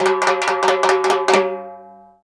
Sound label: Timbales Fill, Roland BK-5; Percussion